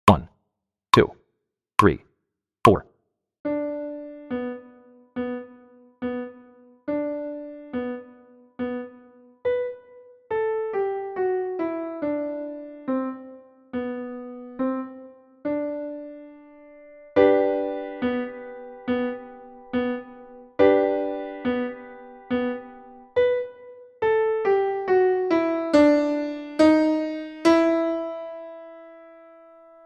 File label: Turkish March 70 BPM